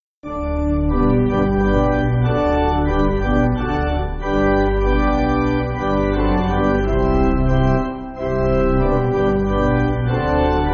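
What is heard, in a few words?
Jesu Elder And Full, pipe organ; G.F. Root 8.7.8.7.D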